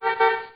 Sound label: beep beep; waze